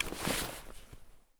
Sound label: backpack use; Escape from Tarkov